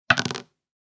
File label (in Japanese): サイコロ二個を振る; ボードゲームやカジノ、麻雀の効果音です。